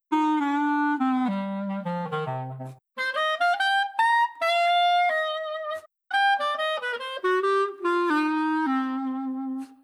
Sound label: clarinet